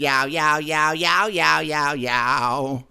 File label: Rap Vox